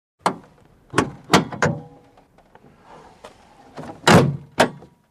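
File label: Modern Car Hood Open Close; hood o/c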